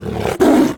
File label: roar